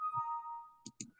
error

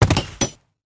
rail construct; Mining

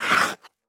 blade, Iceskate; xmas

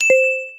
dong

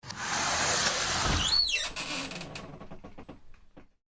booth door

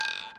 roulette end

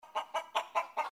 chicken, Farm

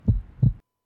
beat

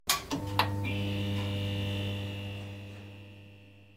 light, tuning

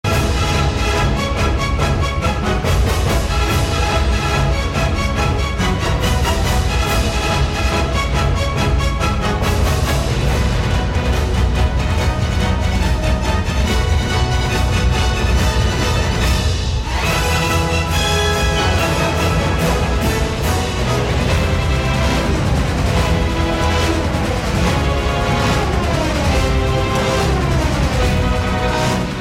horse theme

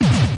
dice spin stop

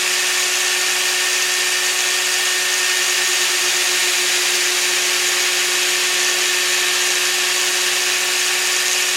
loop, Crate

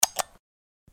craft start

